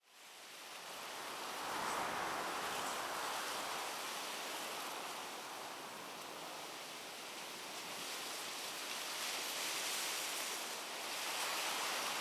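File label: Ambience wind; game builder garage 2021 switch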